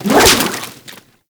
Tentacle Whoosh; Kraken Tentacle Whoosh; Pirate; Warframe

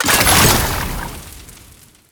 Alchemy Grenade Deposit Fire; Entrati

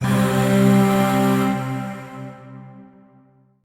Shawzin Chromatic Chord A; Warframe; Zariman; Void Shawzin; Chords

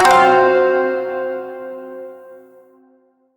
Shawzin Hirajoshi Chord G; Warframe

